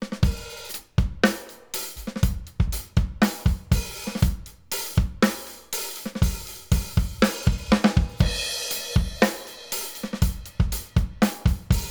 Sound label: Rupert Neve Designs 5254 Drums Fast Fast SC 100 Hz Parallel; 4 zu 1; GR 20 dB; Parallel 33 Prozent